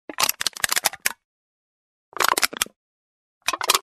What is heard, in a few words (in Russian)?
mnut zhestjanuju banku